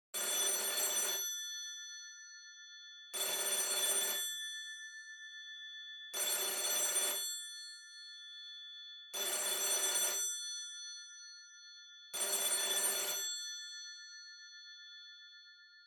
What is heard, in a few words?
Rotary Phone Ringtone